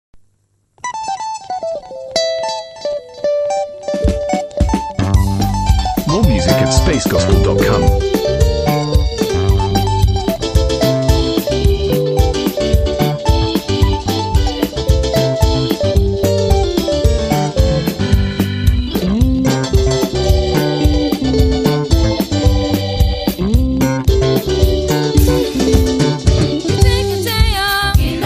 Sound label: protestant trainees choir; Kenya Prisons Staff Training College